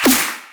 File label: Snare